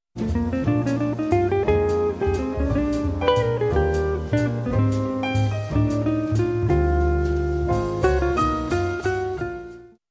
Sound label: Guitar Waltz